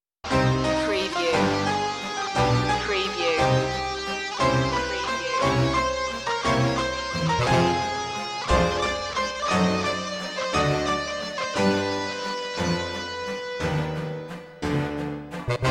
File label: electric guitar waltz